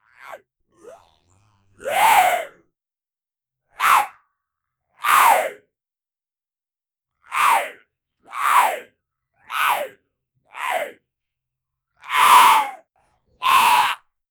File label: zombie screaming shooting